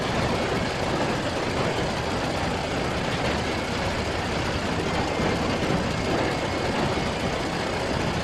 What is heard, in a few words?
Prop Warehouse Prop Rack Move Mid; Titanfall 2; Boomtown